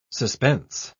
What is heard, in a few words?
suspense